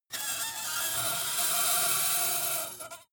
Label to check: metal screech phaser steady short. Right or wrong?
Right